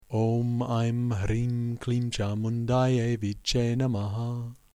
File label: Om Eim Hrim Klim Chamundayei Vicche Namaha, mantras